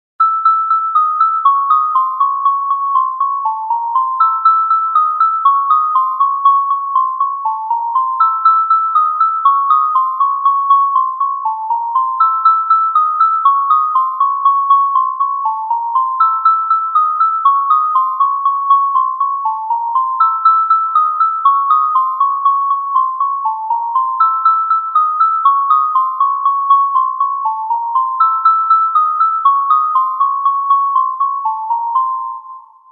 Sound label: Horror Ringtones